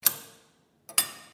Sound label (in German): WC Kondomautomat